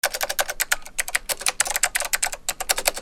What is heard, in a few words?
OFFICE